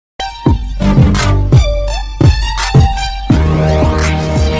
Mega Beats Sms

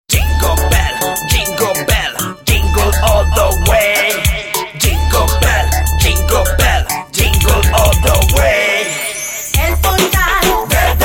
Jingle Bells

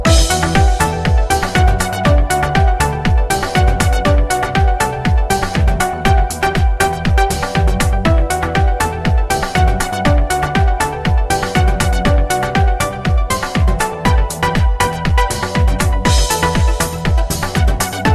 Rocking Bass Tone

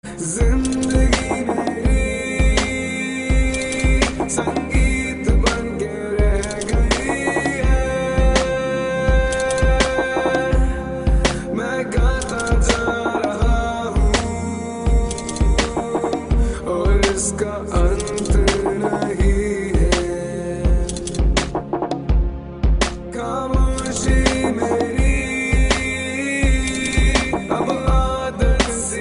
Zindagi Meri Sangeet

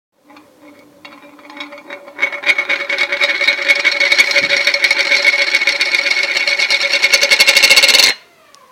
Deklik FX

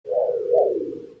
KITT Scanner

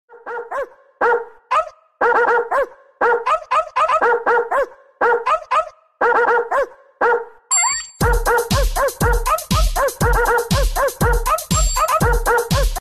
Beat bark

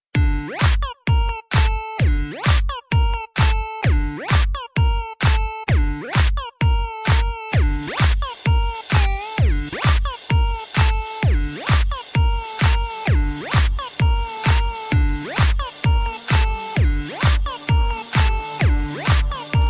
Sensational Beat

